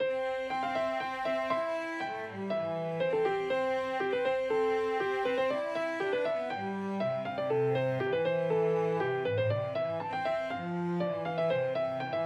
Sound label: Piano and Cello